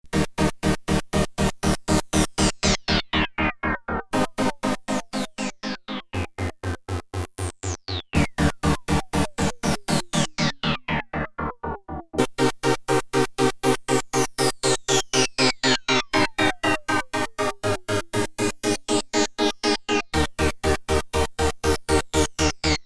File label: PULSE WIDTH REZZ, Oberheim OB-8; Factory Patches